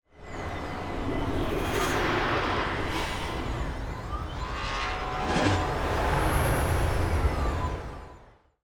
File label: Traffic; LunarCity